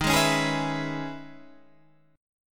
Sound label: Db Minor 7th Eb